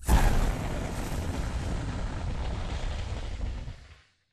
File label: boost generic end; Titanfall 2